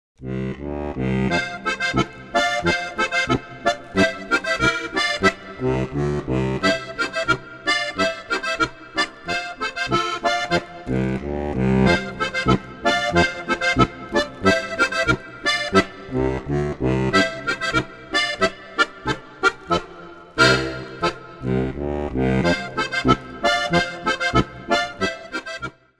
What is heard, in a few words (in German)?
bass knoepferl boarischer